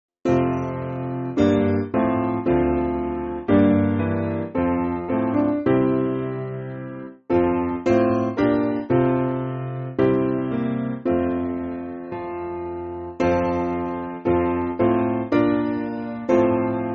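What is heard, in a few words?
Piano, Music Backing Files for Churches; O Dearest Lord; Graffenberg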